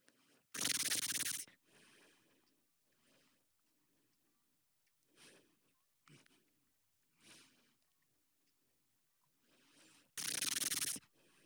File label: bbox